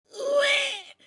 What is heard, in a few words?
baby cry short